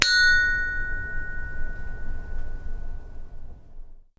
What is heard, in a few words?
chinese bronze bell front; sackler